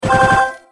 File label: special rainbow Upgrade; sweet shuffle